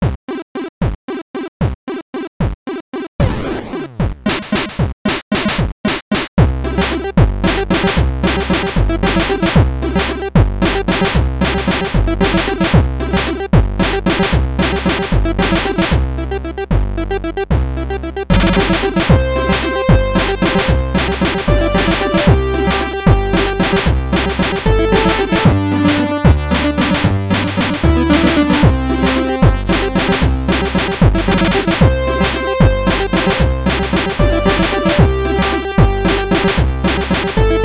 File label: bit oompa; 8 bit oompa